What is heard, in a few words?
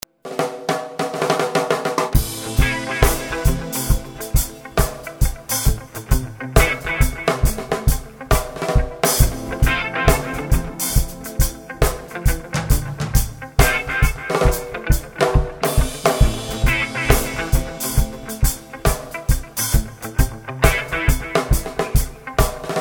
reggae jam